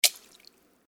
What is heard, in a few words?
Footsteps Puddle One